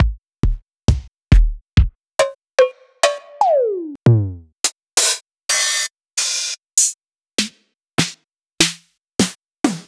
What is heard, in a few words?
Drum Kit Synthwave; Nuttkase